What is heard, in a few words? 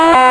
SIREN FRANTIC low; Babylon 5